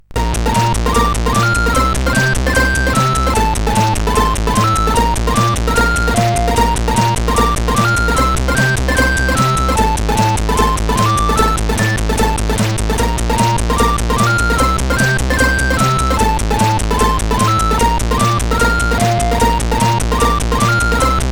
Punkadiddle, sid CSG8580R5

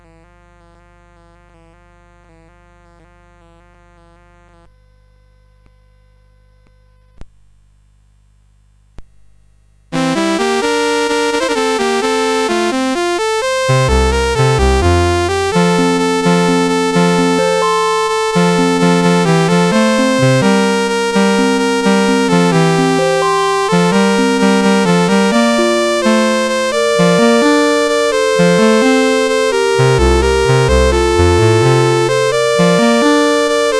Pastores a Beken, CSG8580

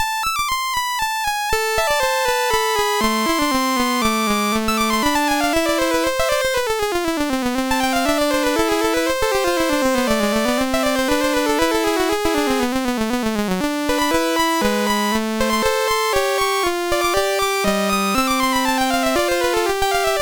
Sonata sid MOS6581R3

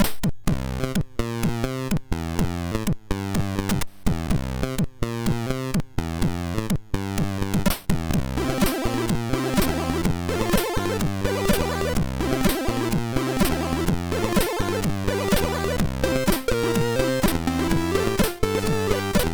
Intro music, MOS6581R2; HCL

